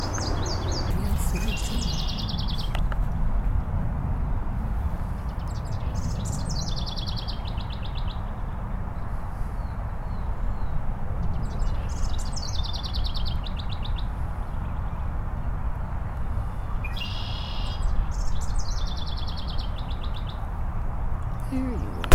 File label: house wren; portage